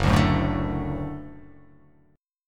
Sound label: F sharp Minor Sharp 5th F sharp